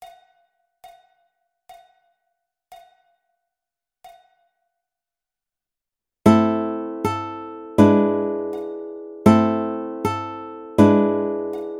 Sakura Sakura Tuff Baritone, ukulele

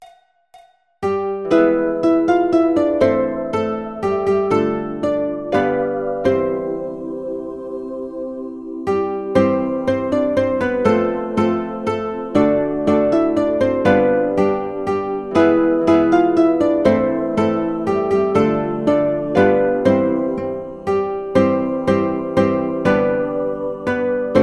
We Wish You A Merry Christmas Ensemble, ukulele

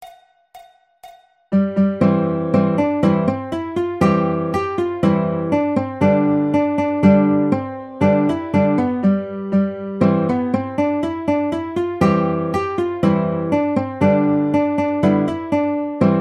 Simple Gifts Baritone, ukulele; Jolly Roger Ukulele Book 1

